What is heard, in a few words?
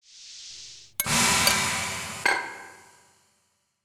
Jar Use